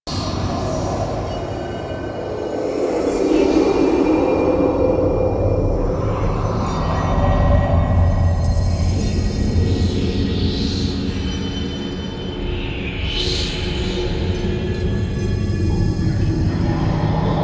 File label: Moon Interior Ambience; Warframe; Orokin Moon